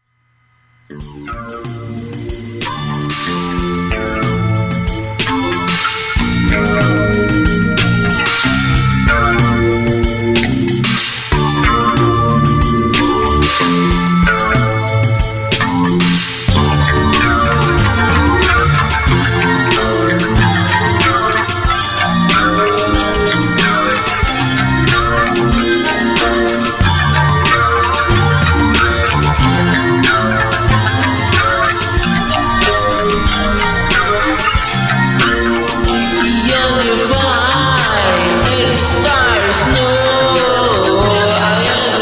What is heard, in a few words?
low hip noise depth low embryonic cells